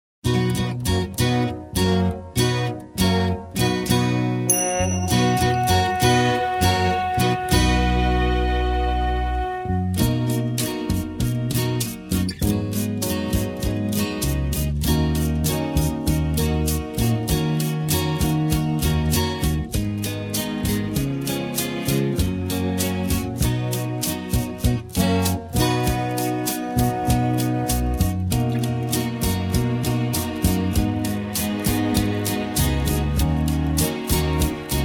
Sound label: brass minus